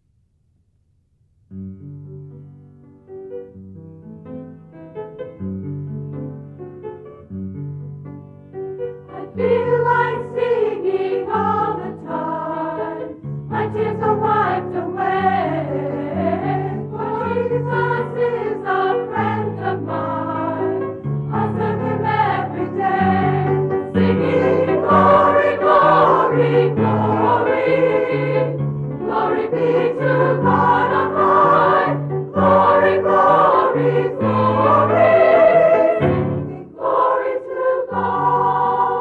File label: Singing Glory Vocal School